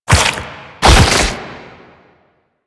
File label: Proto Infested Mutalist Speaker Start Flesh; Warframe; Abilities